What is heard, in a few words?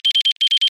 Channel Offset Triple Beep Cleaner